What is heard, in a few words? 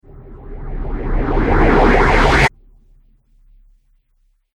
Riser Abrupt Stop Phaser; Rise And Dive Sound Effects